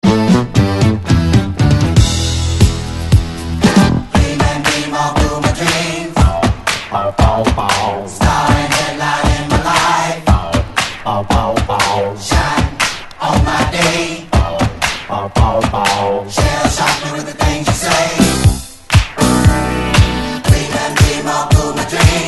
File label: Disco